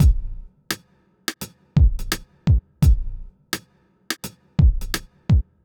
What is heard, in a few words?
lazydays, 85